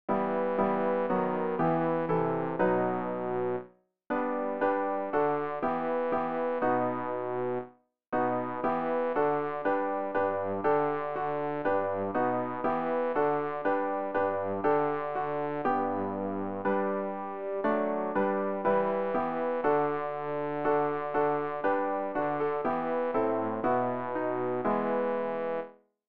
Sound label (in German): bass; Ref. Gesangbuch; RG 674: Auf meinen lieben Gott